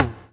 sharp tom drum single hit f key; tom drum samples

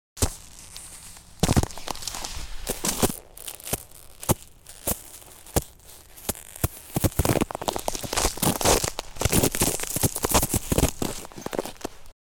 snow samples stepping and crushing

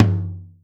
mid tom drum sound f sharp key; tom drum samples

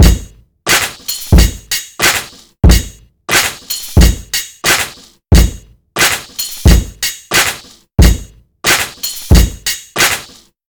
90 bpm breakbeat sample A key

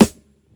small reverb steel snare drum a key

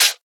natural hi hat sample g sharp key

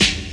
focused acoustic snare e key; Duration 0:01; Channels Mono; snare drum samples

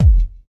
kick drum f key, Loudest frequency: 93Hz; kick drum samples; single hits one shots

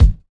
warm sounding kick single hit A key; kick drum samples

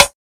00s sharp acoustic snare sample c sharp key; snare drum samples

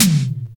80s reverb tom sample d key

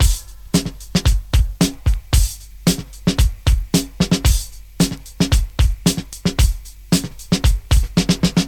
113 bpm drum loop f key

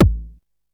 00s long tail reverb steel kick drum sample a key

